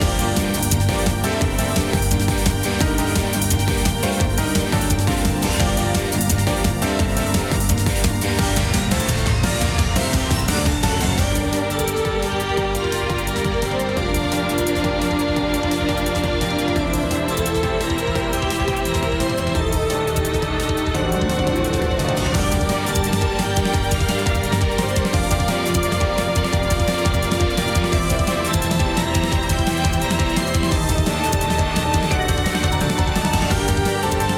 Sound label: Battle Theme Louder loop; Weinaru